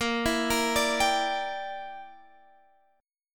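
A sharp 6th Flat 5th chord, Guitar, Half Step Down tuning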